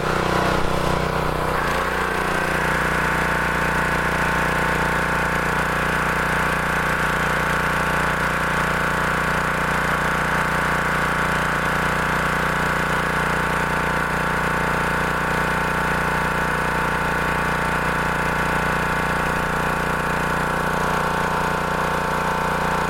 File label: Powerwasher 88-90dB